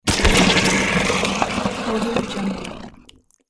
lizard creature death

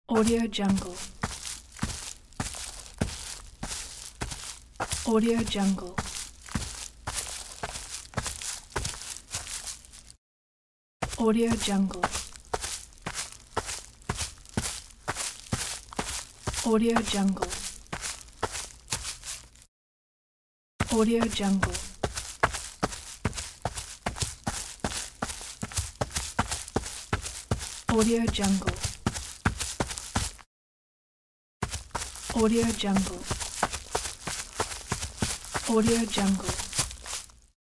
footsteps on grass

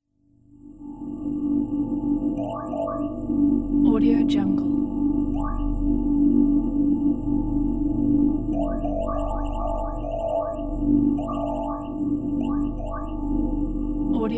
portal; volkovsound

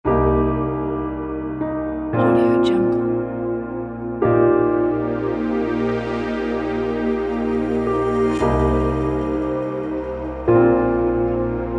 atmospheric electronic chill

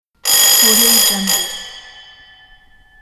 school bell; ideassound

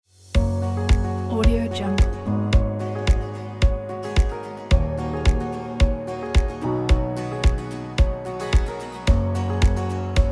inspiring acoustic corporate

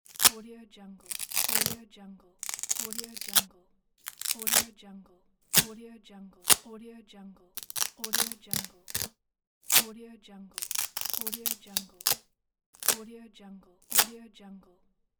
velcro

